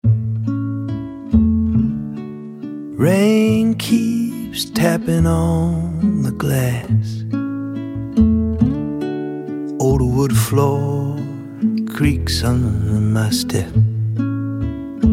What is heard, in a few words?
rainy day read